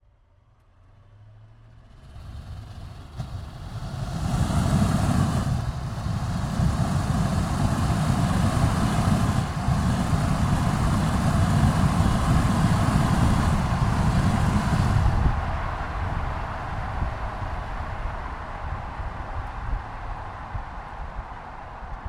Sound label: Range Rover SDV8 - ONBRD - FAST - Drive Various - EXHAUST - Left; Pole Position; Range Rover 4.4 SDV8; BETA58